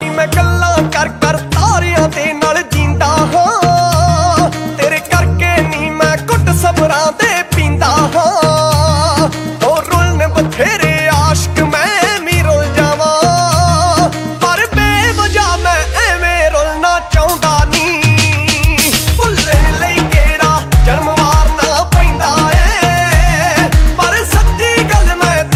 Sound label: Punjabi Beats Ringtone